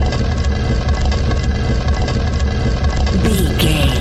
rock door slide block move drag loop

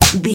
punch slap whack hit

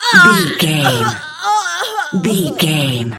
voice female death

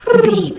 pigeon call calm

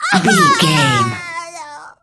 voice female death intense

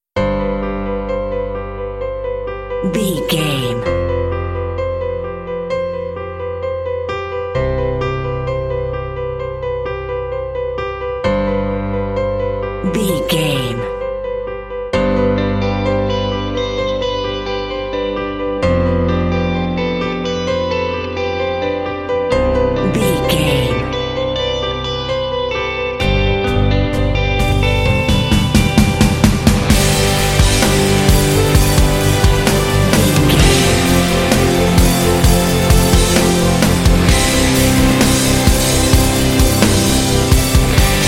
anthemic ocean waves

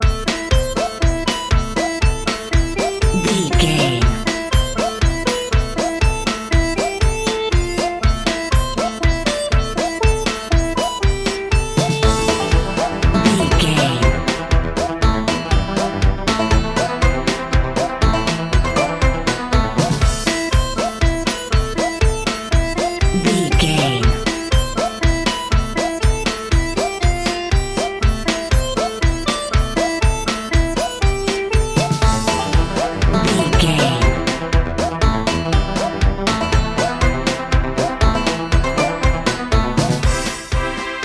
music fun crazy circus

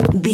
footstep woodland

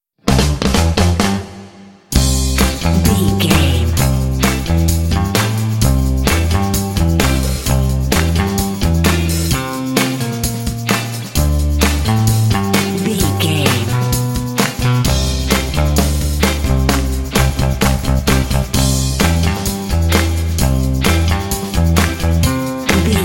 60s tv cheesy theme song narrative

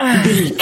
voice male effort action release

